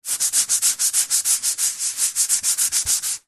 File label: household sponge pad wipe clean wooden worktop kitchen; zapsplat